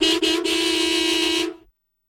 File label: Car Horn Triple Honk D, american